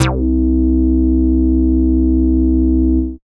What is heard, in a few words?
Moog Bass, Dance Synths